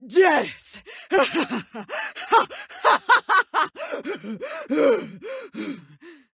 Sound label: something scary